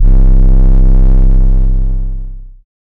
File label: 808 bass wiggly; alien